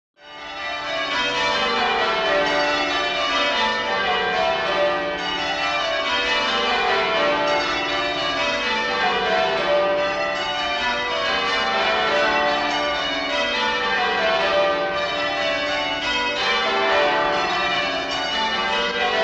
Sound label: Church Bells Pealing; bell towers